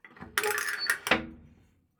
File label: Submarine Metalsound